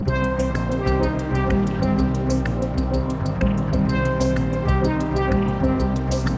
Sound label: Electro